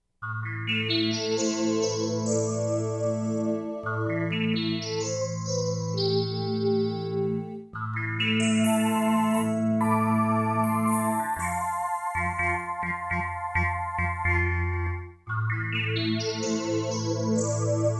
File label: K5 MKS70, K5 MKS70 Hybrid; 3 K5-MKS70-Hybrid